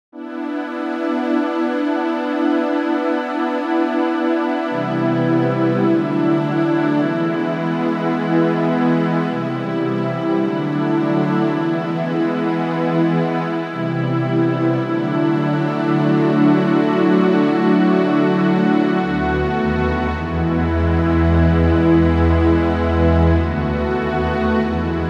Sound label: Rich Strings